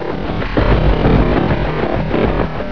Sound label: magic